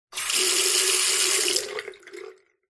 household tap faucet basin bathroom turn on run short drain no plug; zapsplat